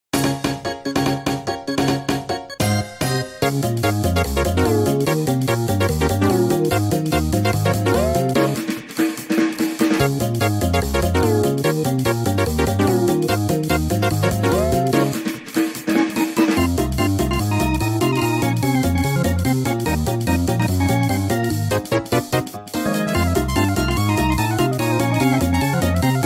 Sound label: Slot Machine Theme